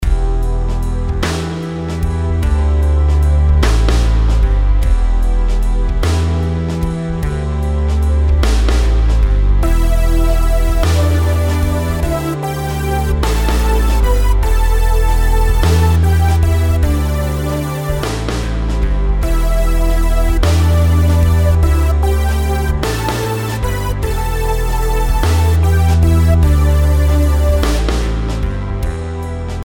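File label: melodies compound 8s E minor; melody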